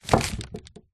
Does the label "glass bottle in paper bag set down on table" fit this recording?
Yes